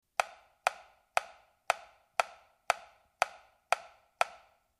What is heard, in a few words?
WoodBlock